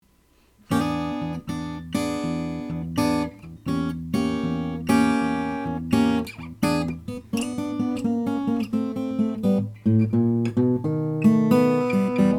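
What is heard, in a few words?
huss dalton custom crossroads adirondack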